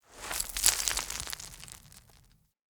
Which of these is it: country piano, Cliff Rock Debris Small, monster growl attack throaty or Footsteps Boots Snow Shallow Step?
Cliff Rock Debris Small